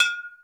Jug, Drum Machines Alesis D4

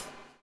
BUBL, BUBBLE; Spectrasonics - Backbeat

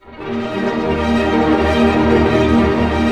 ARP D# MJ PP, STR ARP MAJ; Sound On Sound - Peter Siedleczek's Orchestra

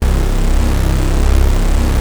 Prophet 5 C2, Synthesizer; Hollywood Edge - Giorgio Moroder Rare Synthesizer Collection

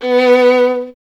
STR VLN, Vln Detache; Miroslav Vitous

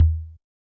Yamaha DJX 2B, E Tom

